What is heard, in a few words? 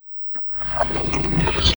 Slerp Up